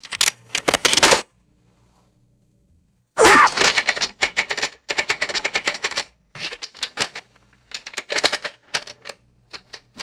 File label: hollow plastic answering machine bashing and rattling